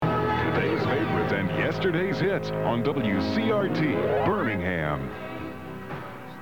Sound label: jingle; wcrt